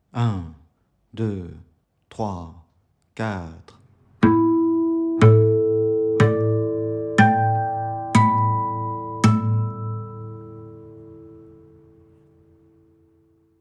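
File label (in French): harmoniques 7e frette 060 bpm, guitare; harmoniques 5e frette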